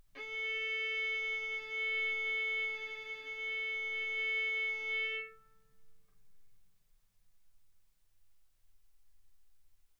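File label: Violin arco mf A string A4 B4 stereo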